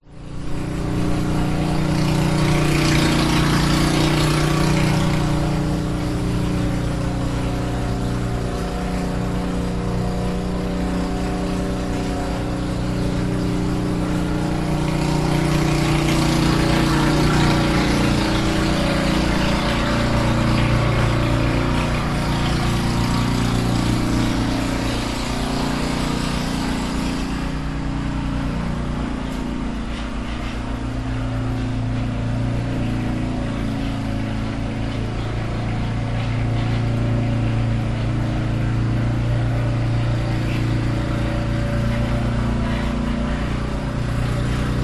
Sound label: household lawnmower operating outsdide open windows internal